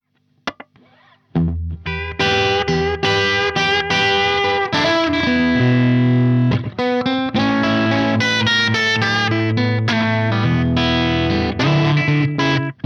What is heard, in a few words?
Echotone Blues; Carl Martin